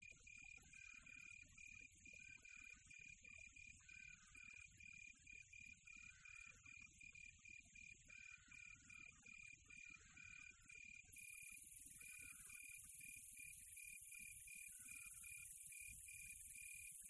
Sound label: NATURE Quiet Tropical Night With Cricket; ATMO; smartsound